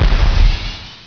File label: Menu Popup; Sub-zero; PLUS! Themes